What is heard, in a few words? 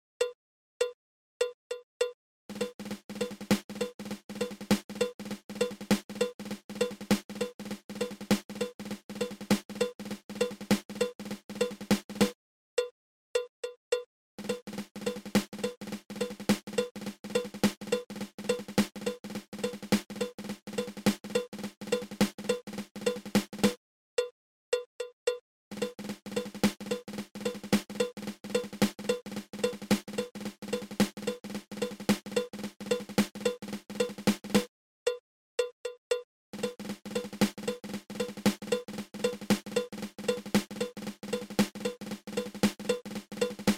Triple Ratamacue